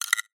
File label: xen infest click; Half Life Alyx